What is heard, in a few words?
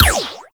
Shot; Warframe; Weapons; Tenno